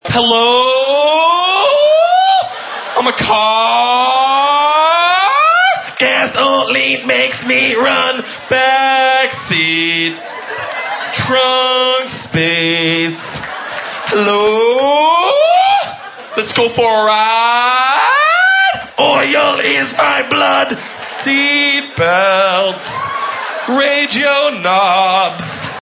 car alarm

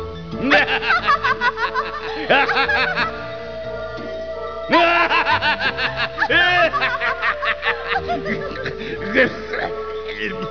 laugh

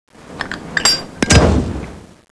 ignite

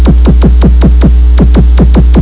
Shot; Shots fired; Spread Shots; Raiden

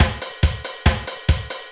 train 140

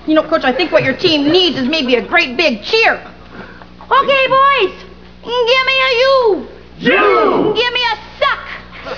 cheer

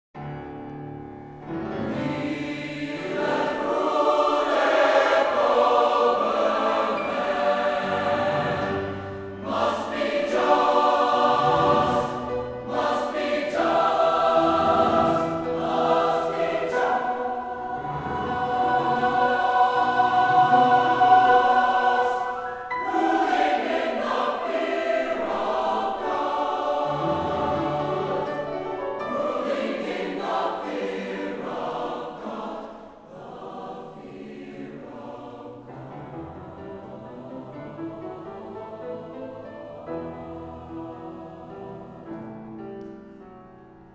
Honor Choir; CMEA

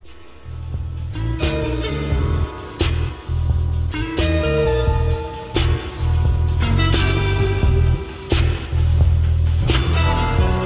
slinky blues

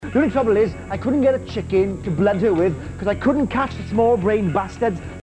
chicken

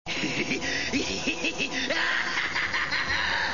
laugh; vegeta

